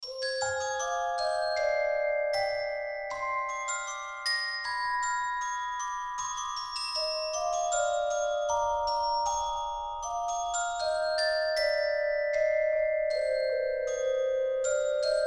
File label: molecular improvisation; adalovelace